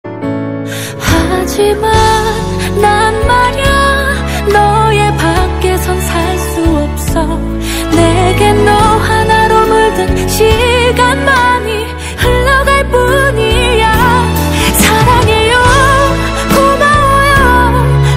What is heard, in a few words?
korean ringtone